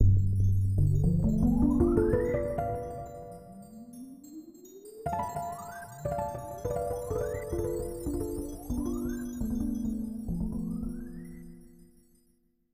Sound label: Break the Curse! ~ Harp; Do-Re-Mi Fantasy Milon no Dokidoki Daibouken